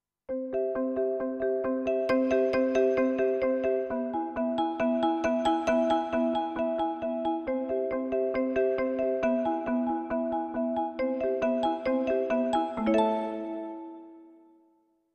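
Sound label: Log Drums, digital piano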